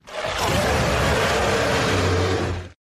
roar; varcolac

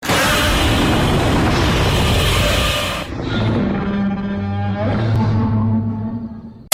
godzilla airport roar

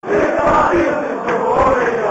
fans chant

